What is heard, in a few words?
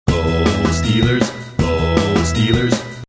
Ringtone; GoSteelers